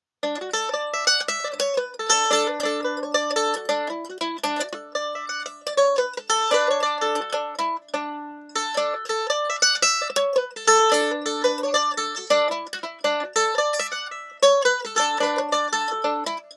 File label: Mandolin Pick Comparison